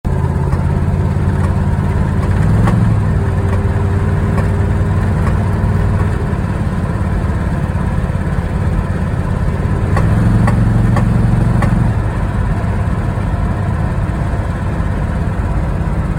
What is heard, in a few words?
1980 camaro z28 clacking noise